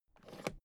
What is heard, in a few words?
suitcase open latch lock fast